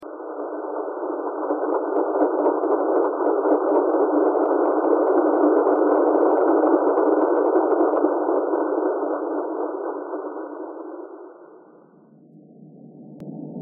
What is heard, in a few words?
thread pentatonic